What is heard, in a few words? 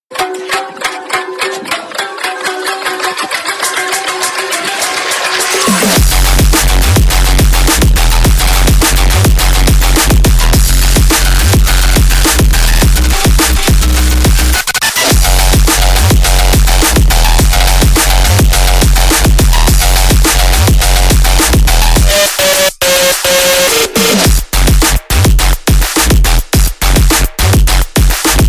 phonk; bike